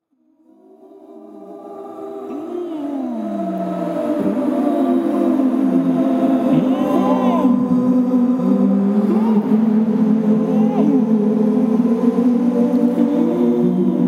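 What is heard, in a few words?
Lament For Whales плач китов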